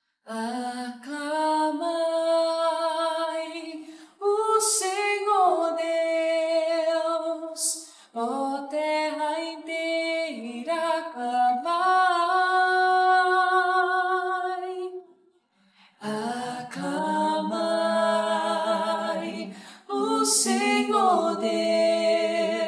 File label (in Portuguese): salmo 65 aclamai o senhor deus terra inteira